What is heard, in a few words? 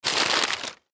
Paper Bag Pick Up Lunch Packaging Brown; Foley; Blastwave